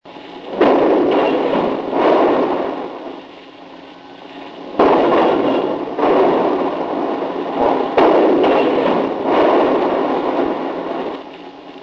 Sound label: depth charge